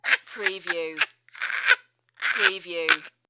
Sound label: Old Lady Laugh